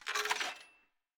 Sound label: scatter Counter Up; cashomatic